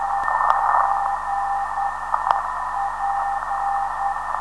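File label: 297kHz, non-directional beacon; PEP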